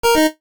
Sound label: jingles retro; Music Jingles; Kenney